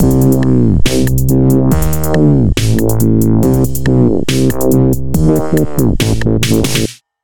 140 dub break

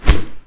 Hit

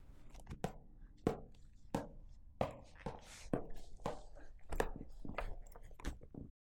Ball And Tennis Racket

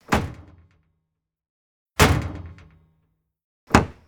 Dumpster Garbage Bin Lid Heavy Open Thud

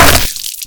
Messy Splat

